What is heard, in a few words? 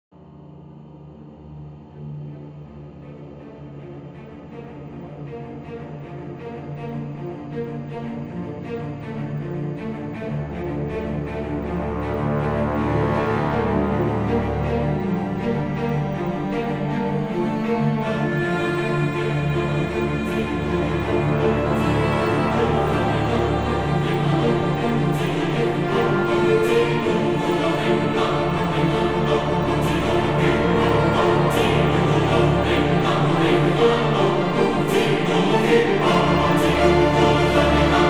Hell Epic 160BPM No Percussion, Cinematic Trailer Music; BlueFX